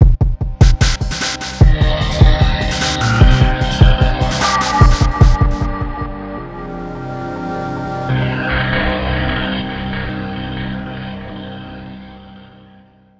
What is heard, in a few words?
Retro Game Over; super stardust portable; playstation portable